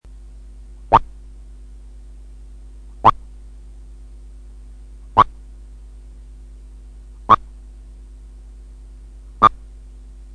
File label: Glide and whistles